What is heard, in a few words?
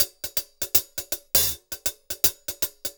080, Top Ends, Beats; Zero-G; Monster Beats